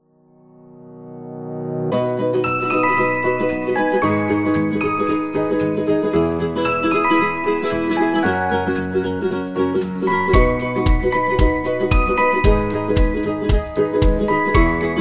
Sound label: Background Acoustic